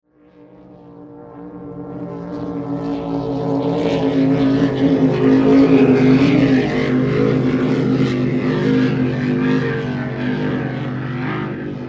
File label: Motor boat in motion